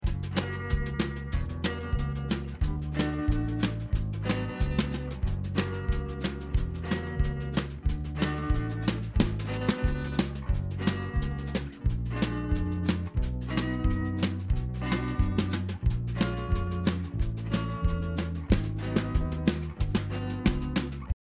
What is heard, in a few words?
loop